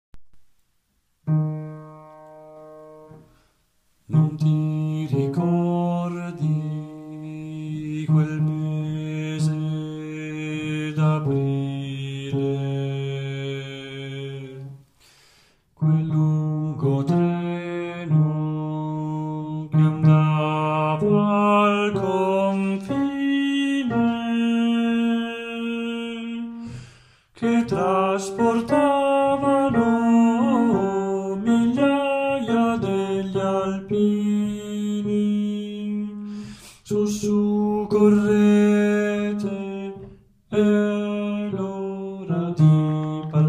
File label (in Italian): baritoni; Monte Canino